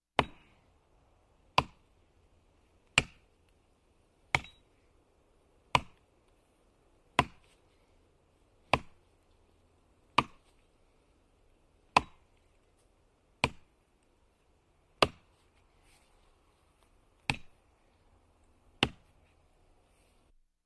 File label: axe chopping wood sound effect; skywind sfx